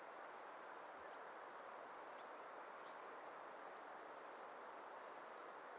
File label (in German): gelbbrauen-laubsaenger